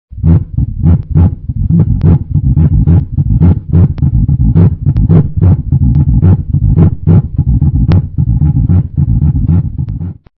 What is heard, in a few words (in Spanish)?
zambomba; tradiberia